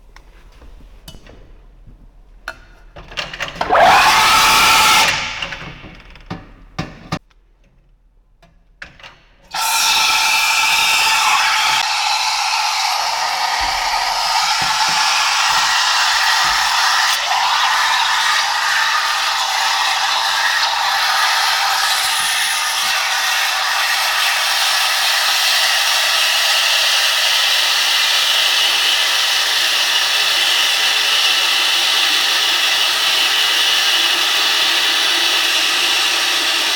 Milchschaeumer; Cafe in Lissabon

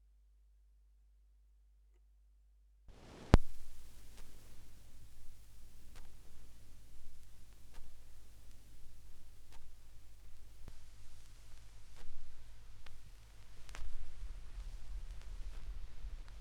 schallplattengeraeusche

